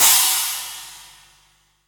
Crash, Cymbals

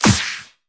Pre-Shifted Claps; Vengeance Samples

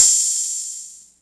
OP Hihat; Open Hihats

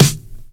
Snare; Vengeance Samples

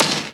Snare; Vengeance Samples

